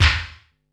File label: 100 INT SD FX, SD KIT, Industrial Loops; USB Soundscan vol.24; AKAI